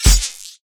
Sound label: light sword